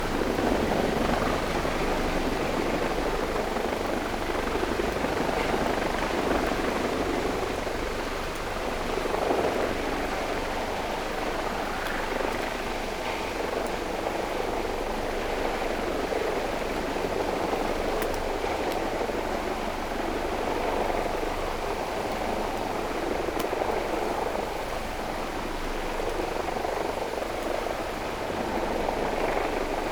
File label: No Ship, helicopter